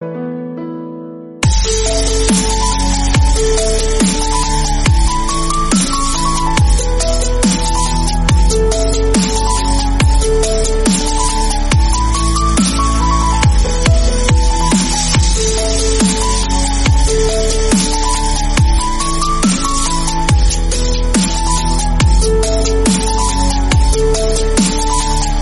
remix

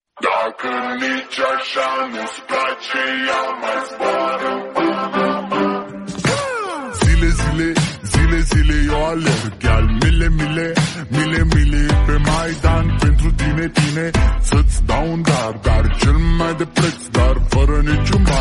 slowed reverb bgm

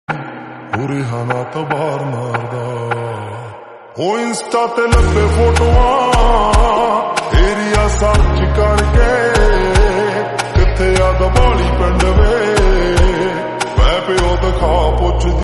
punjabi slowed reverb

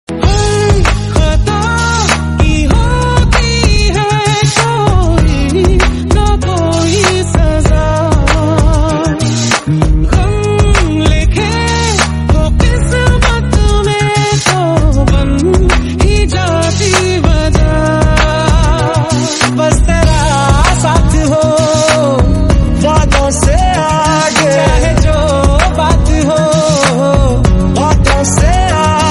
mashup Bollywood

